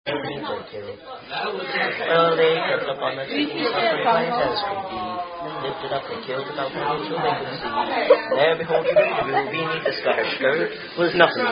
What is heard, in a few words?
Drunken Drinking Songs